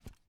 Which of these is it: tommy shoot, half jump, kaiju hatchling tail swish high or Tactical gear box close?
half jump